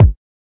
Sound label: kick